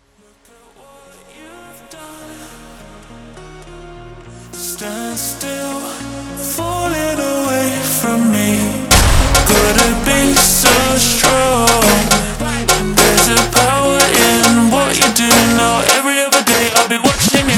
FUNK Super Slowed

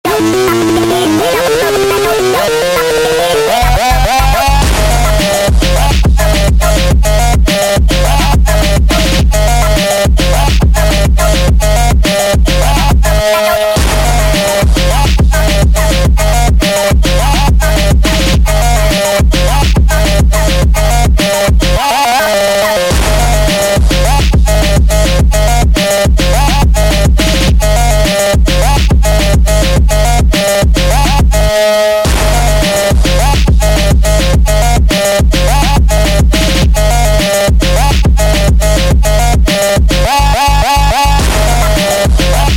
Phonk

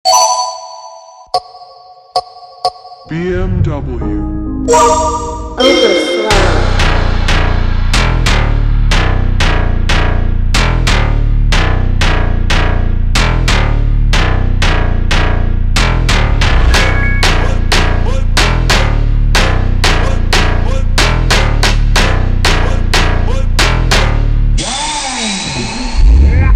Automotivo Over Slow Reverb

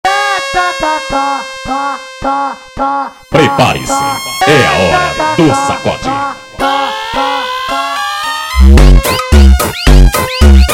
Siren Funk

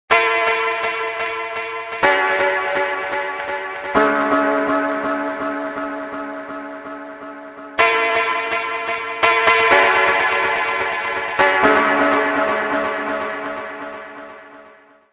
Haunted House Phonk Melody; ringtone

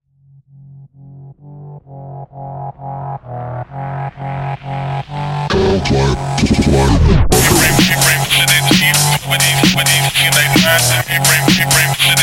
Phonk

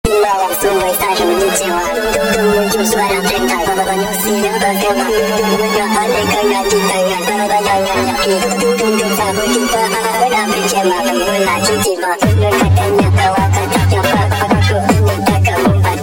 Hardbass sped Up

